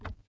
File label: footsteps Metal